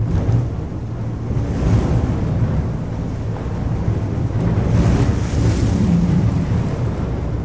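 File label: whirlwind; EnvSound; whirwind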